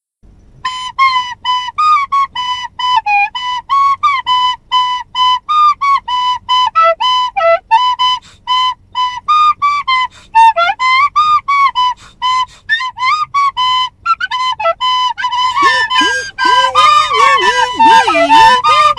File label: song